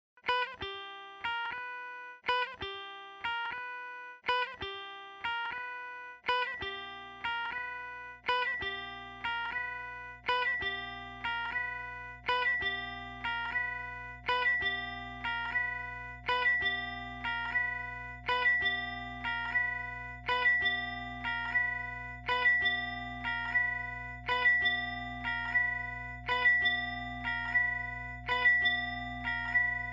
cloche

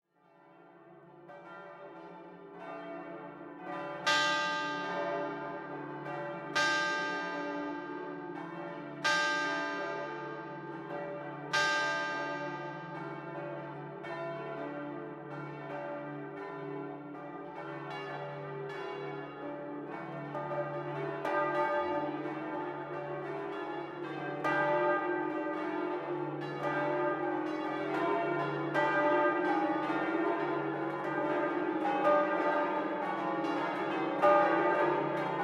cloches mix

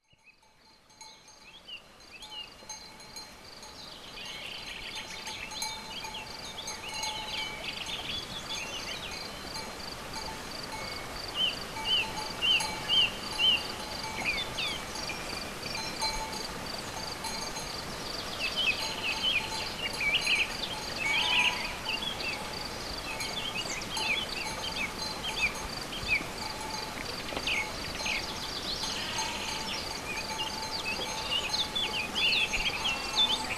oiseaux moutons; chez le maitre

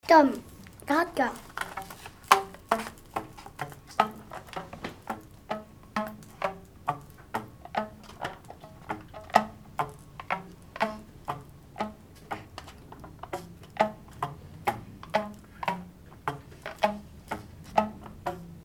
gratte corde

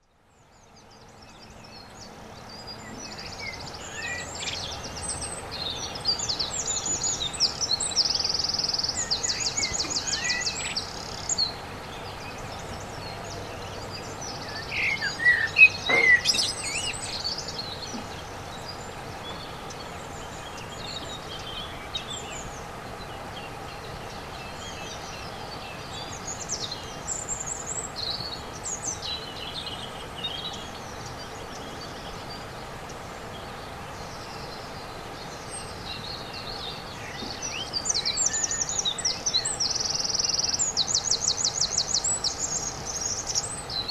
Merle et rouge-gorge

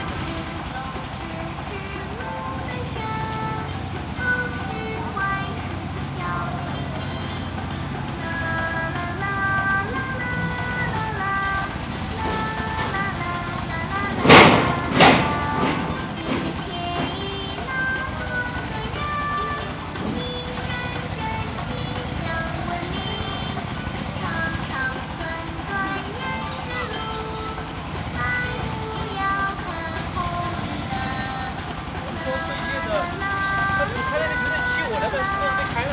parc urumqi

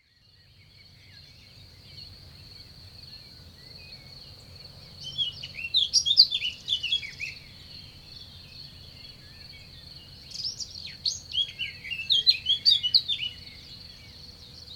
oiseau sonnaz

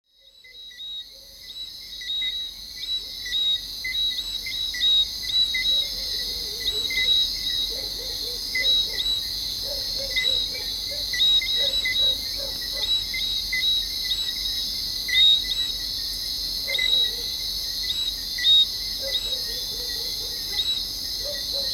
nuit antille vagues

